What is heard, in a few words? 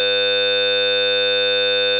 square 20000 mono